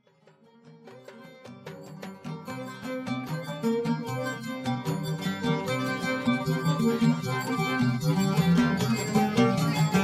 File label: instrumental